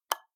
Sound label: pause continue click; ⩽ Seele ⩾ 【selyu】